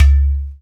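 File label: percussion udu; Roland LCDP14 Africa VOL-2; Afro Menu 1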